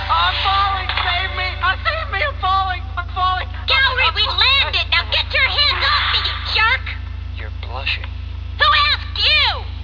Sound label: falling